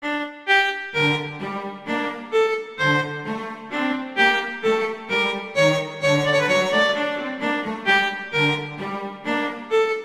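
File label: Duet, Tempo di Marcia, viola; Mazas; Duets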